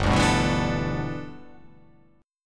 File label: G 6th Add 9th G, chord